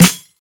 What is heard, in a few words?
clear acoustic snare sample f key, steel snare drum sound tuned to the F note; snare-drum-samples